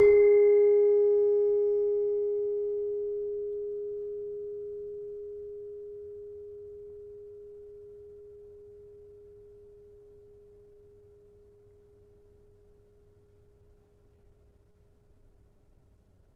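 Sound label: Demung 4 G sharp, gravity harps tuned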